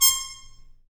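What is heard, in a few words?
Harmon Stabs, Brass; Roland L-CD702; Harmon Amb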